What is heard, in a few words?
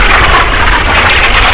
shell whiz; emplacement